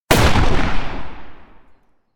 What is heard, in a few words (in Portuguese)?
tiro; helena cena 17